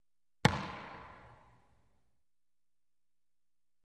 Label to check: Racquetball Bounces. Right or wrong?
Right